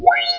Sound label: monitor on; Emperor - Battle for Dune